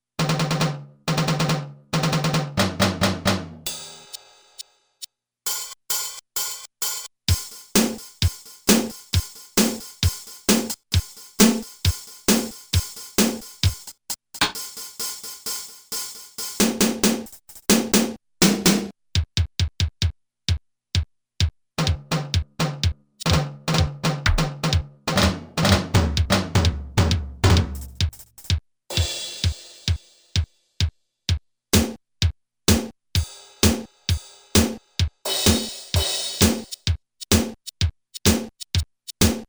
Power Drums

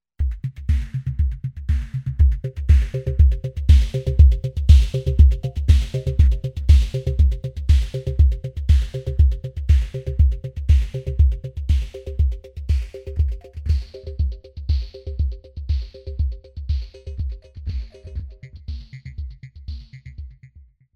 Damp Beat

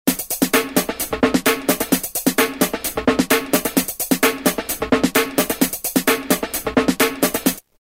HipNoKik 130; Roland M-DC1

